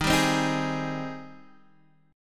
Ab Major 7th Eb

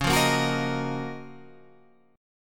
F sharp Major 13th C sharp

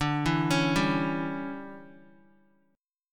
D Major 7th Suspended 2nd Suspended 4th D, Guitar; DM7sus2sus4; arpeggiated; JGuitar

